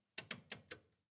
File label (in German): Schalten